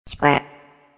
click; monstergo; pokebot

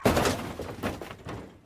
car crash side; lightning speed

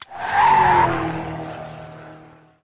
ui btn press pause; lightning speed

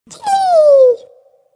connect; monstergo; pokebot

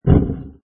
menu move; inlogic-castledefense

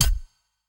bounce; papersiomania

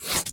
crossed, tic tac toe; tictactoemania

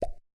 candy pop; sweetcandymania

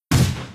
enemies shooting die; spider-man-mysterio-rush-game

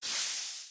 hazards gas rockets launch; spider-man-mysterio-rush-game

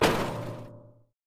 object hit metal barrel; lightning speed

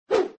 tap; inlogic-basketballmaster

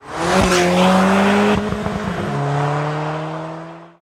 Driveby; Rally Champ

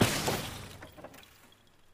object hit wood barrier; lightning speed

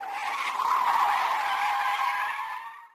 car skid long; lightning speed

